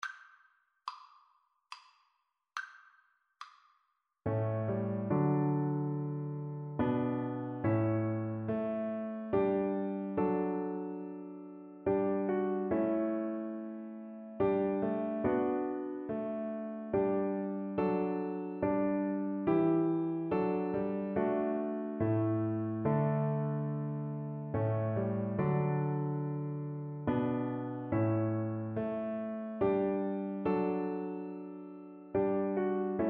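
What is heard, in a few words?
viola karaoke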